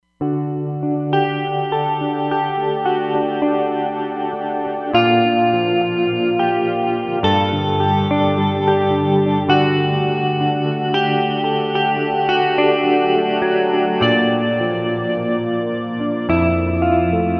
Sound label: Mystic Gtr